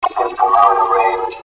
system out of range

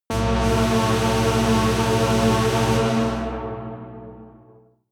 synthesized saw drone low

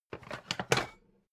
pan stack slide soft; objekte; topf

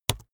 keyboard laptop enter press fast hard; kommunikation; computer

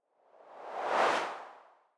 whoosh simple incoming; swooshes-swishes

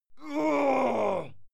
male fight scream pain muted; soundpool

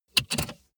car bmw e46 interior safety belt clasp release

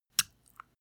step barefoot wet light; schritte

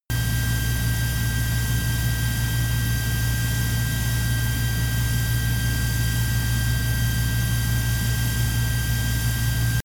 plane bombardier learjet turbine idle direct; flugzeuge verkehrsflugzeuge; verkehr transport

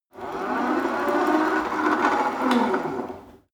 rope trainer pull sequence slow short

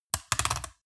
metal device rattle click; industrie-wirtschaft

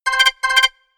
notification call

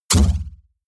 sci-fi hissing blast; comedy-cartoon; pistolen-gewehre

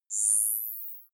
zoom in sweep

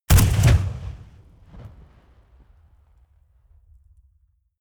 rifle ak-47 exterior fire single huge; comedy-cartoon; Pistols and guns; pistolen-gewehre

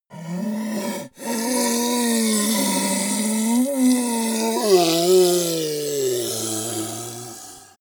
ogre dying groan weak slow

